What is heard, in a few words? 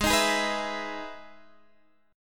E Major 7th G sharp, chord